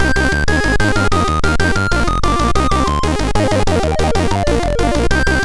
Test Arpeggio, sid MOS6581R4; Driver 11

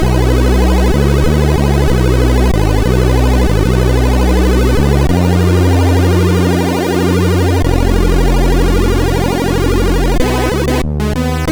Walking Bass; MOS6581R2

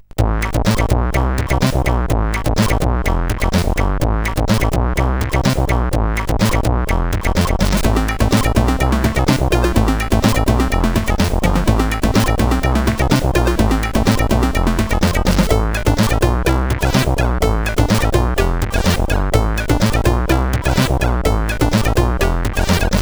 sid, CSG8580R5; Caps House

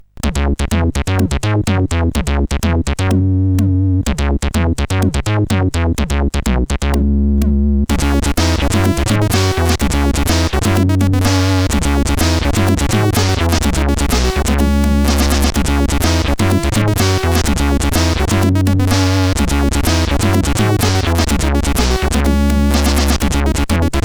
tune, sid CSG8580R5; Krombacher tune 4; Cyberdyne

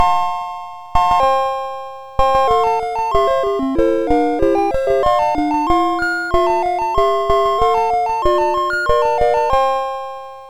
sid MOS6581R4, GAMES; Chinese Juggler

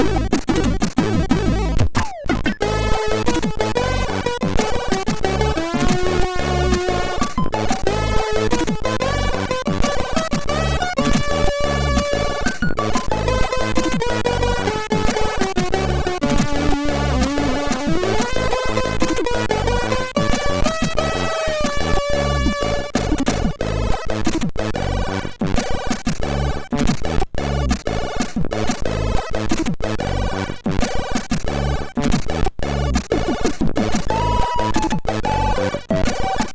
Pod Jams, sid MOS6581R2

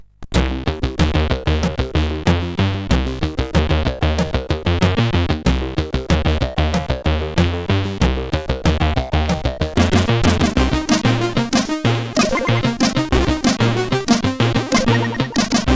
Mad4, CSG8580R5

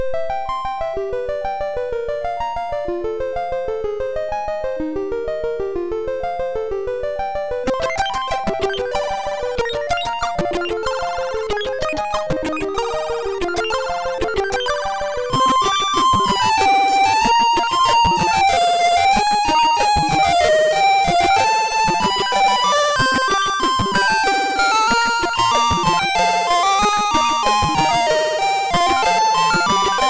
Medieval Tune, sid MOS 6581R2; hvsc; Thoerndahl Jesper

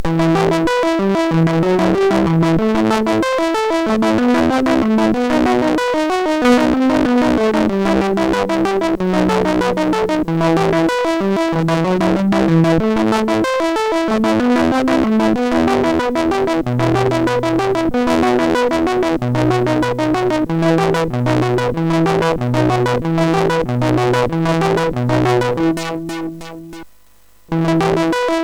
Instrumental Compositions, sid MOS6581R4

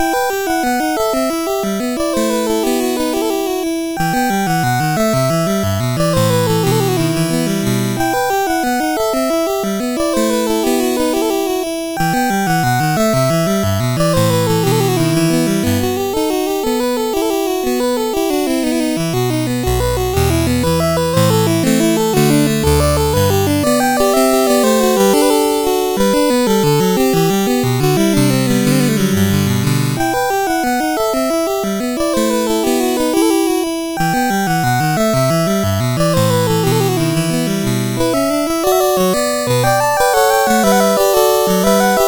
Gigue en Rondeau, CSG8580R5; Joseph A Grau

